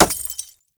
Bullet Impact Glass Large; Weapons

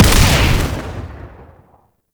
Antimaterial Projectile Explosion; Warframe; Entrati; Conquest

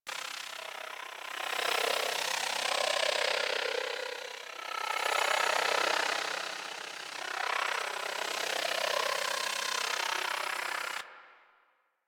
Sacrifice Symbol Ambient Loop; Warframe; Quests; Chamber

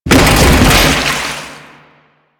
Bodyfall Water

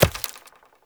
Bow Arrow Impact Vegetation; Weapon Impacts; Player; Bows; Hunter Bow; Environment; Envoy Hunter Bow

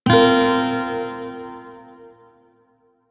Shawzin Hirajoshi Chord, Music; DE; Warframe

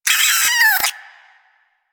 Vocal Pain, Hunting Critters; Warframe; Cryptilex

